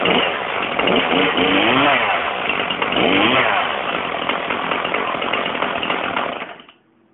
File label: chainsaw